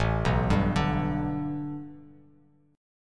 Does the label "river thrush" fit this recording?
No